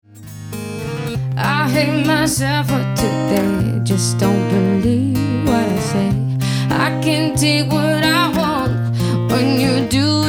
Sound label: Supersimple Melody Thailand